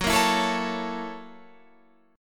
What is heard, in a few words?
Gb 7th Sharp 9th Gb, Guitar Open F; Gb7#9 strummed